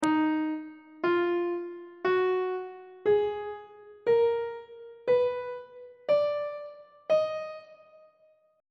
d sharp harmonic minor key signature on treble clef